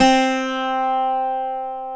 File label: BOUZOUKI C3; AKAI S6000 CD-ROM - WorldSounds